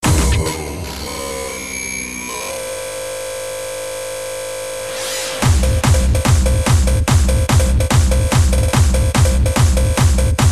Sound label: Hardstyle